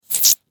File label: Insect Hiss Angry, Insect, Hiss, Angry, Bug; Animals:Insects; Insect Hissing; Blastwave